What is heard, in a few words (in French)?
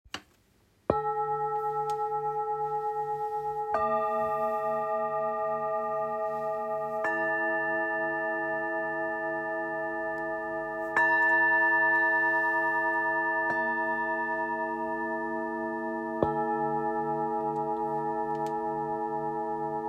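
Set, bols chantants tibétains; professionnel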